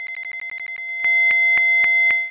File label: Supersonic; Pokemon; Gen 2; attack moves; GSC